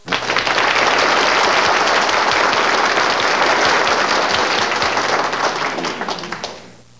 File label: applause